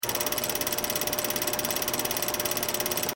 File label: movie camera